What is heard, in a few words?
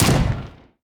heroes death From Above attack explosion; onagro